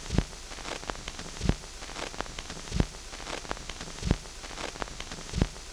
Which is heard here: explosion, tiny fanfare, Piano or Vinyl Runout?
Vinyl Runout